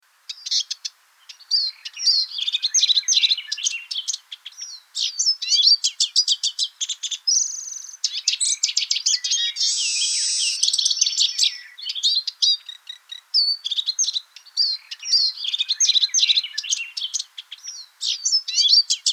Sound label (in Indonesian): suara siskin eurasia